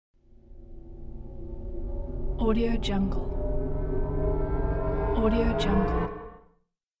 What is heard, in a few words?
cinematic sound design